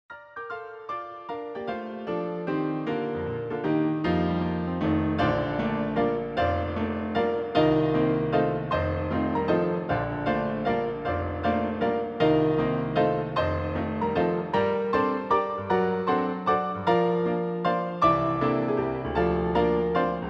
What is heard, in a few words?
piano roll